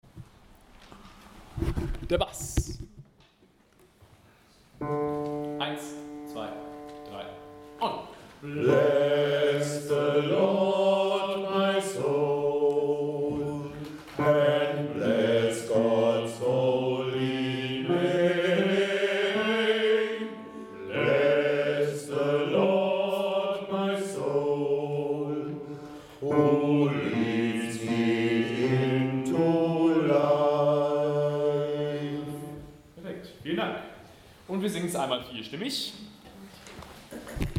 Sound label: Bless the Lord Bass